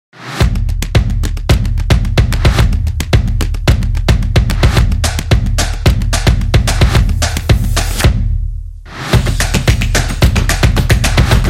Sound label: This Is Drums Action; casual game